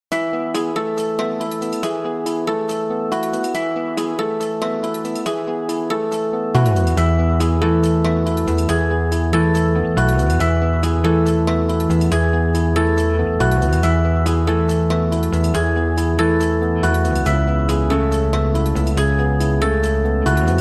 Everything Clicks

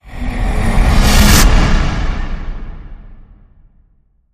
Gone In A Whoosh

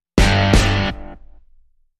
Sting Hits

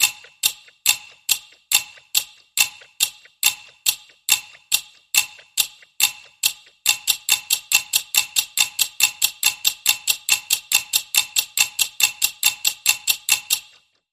Clock

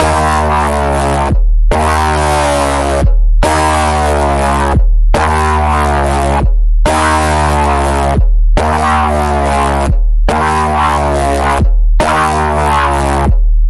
Pulse, D sharp

